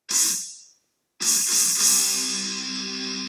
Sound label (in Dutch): Muziekfragment Rock